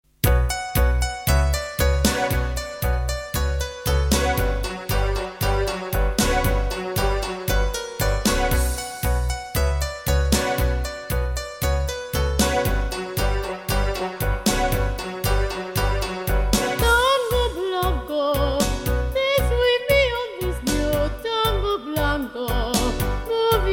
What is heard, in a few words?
tango blanco with vocal